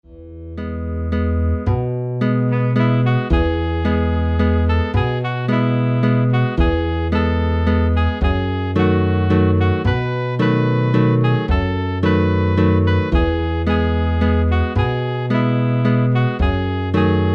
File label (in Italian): coroncina maria visitazione chitarra; canti madonna